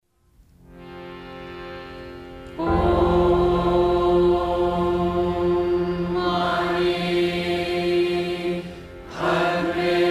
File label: OM MANI PADME HUM